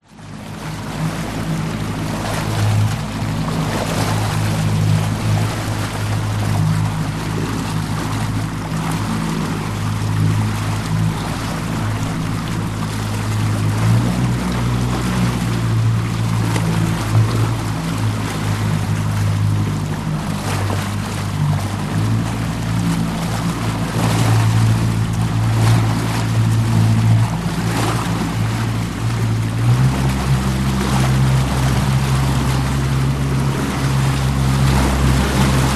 transport motorboat driving sea close to outboard motor